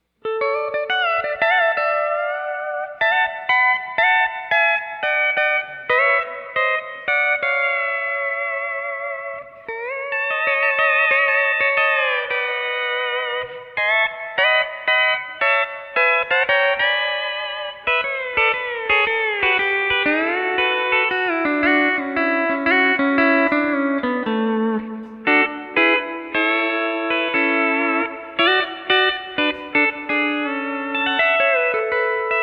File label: 12 String Keyless, Steel; Excel